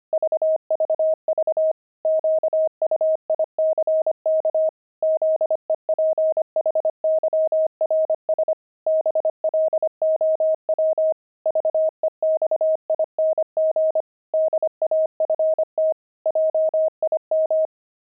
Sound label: pangram, intermediate pangrams files; zebras